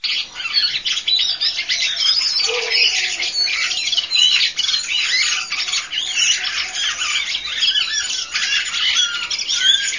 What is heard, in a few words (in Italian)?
Sassellara